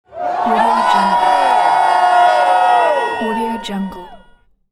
people concert screaming cheering; human sounds; جمعیت در کنسرت در حال ج

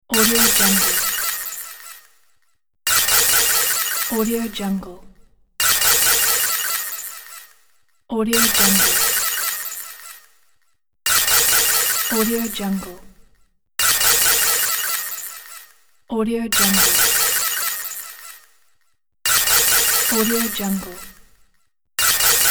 cartoon industrial future machine process

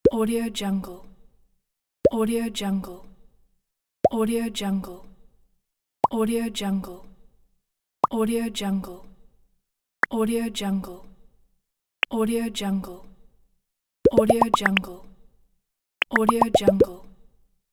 super plops pack

